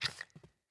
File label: Kubrow Puppy Idle Snort